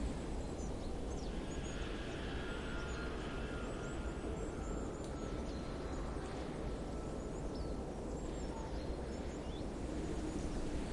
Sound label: Daytime Ambience; Artifice; Lethal Company